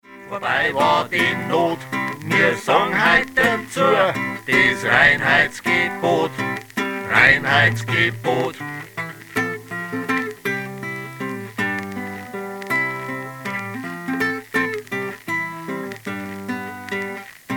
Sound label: Landler